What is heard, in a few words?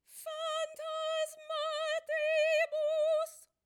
choir